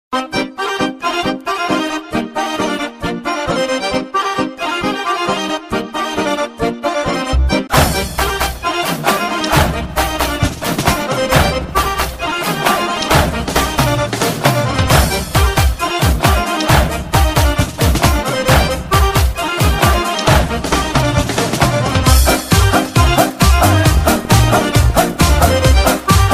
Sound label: Romanian Etno